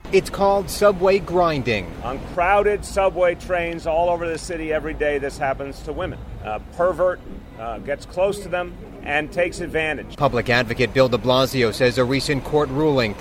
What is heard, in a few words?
subway grinding